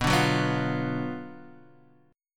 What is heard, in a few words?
F sharp Major 7th Suspended 4th Sharp 5th, B, chord